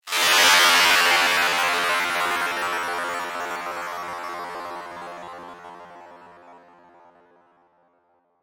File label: Scifi, Digital, Sweeper, Science, Fiction